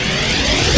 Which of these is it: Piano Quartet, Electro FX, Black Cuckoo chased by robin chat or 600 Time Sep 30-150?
Electro FX